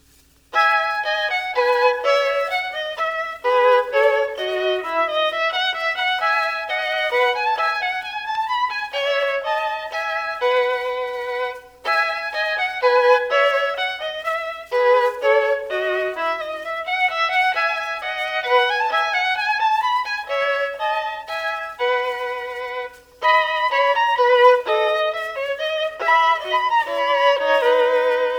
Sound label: Violin Partita #3 In E, Menuet; J.S Bach; BWV 1006